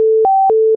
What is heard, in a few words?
beep